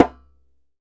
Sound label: DJEMBE, African Drum